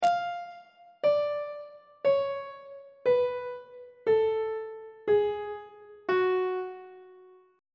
f sharp harmonic minor scale descending on treble clef